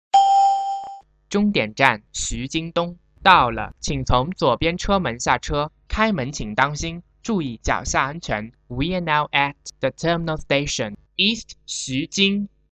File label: east xujing arrive, subway